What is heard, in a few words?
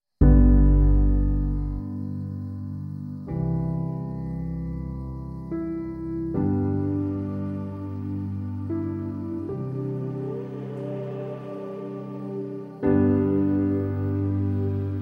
instrumental